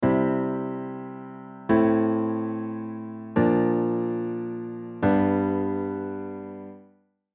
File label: Chromatic Alterations